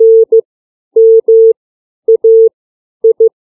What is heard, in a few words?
CW alphabet